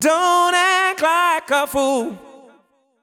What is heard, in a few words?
deep house male, 125, A, vocals